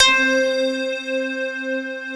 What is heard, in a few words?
Casio VZ1, A#4